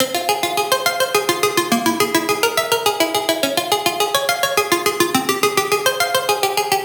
Riddim Melodic Loop F 140 Crickets; Ghosthack; Riddim Essentials Volume 2

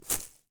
Footstep Grass Medium

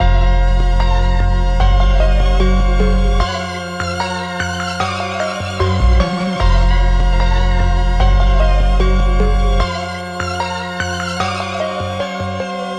Full A#m 150, Trap; HipHop; Melody Kits; Eerie; GhostHack; Ghosthack - AC2024 - Day 5 - Melody Kits

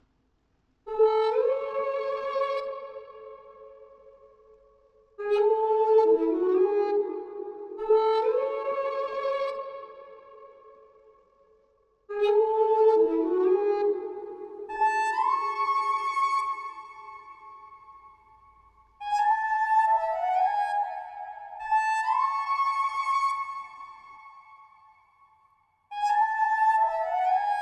139 BPM C# Min Flute; Loop Stems; Cymatics; Benz

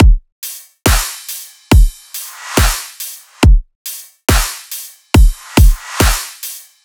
Riddim Drum Loop 140 Full

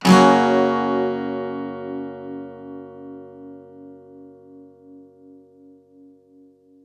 Guitar 140 BPM C#maj, Guitar One-Shots; Ghosthack Advent Calendar 2021 - Day 10; Guitar Loops and One-Shots

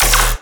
Bass Hit D#, Dubstep

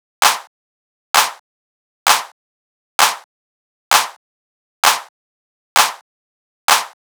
Clap Loop 130, Techno

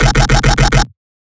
Riddim Bass Hit E; One Shots; Attack; Ghosthack; Riddim Essentials 2020

